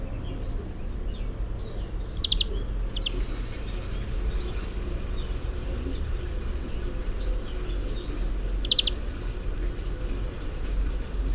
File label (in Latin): Chloris chloris; SETE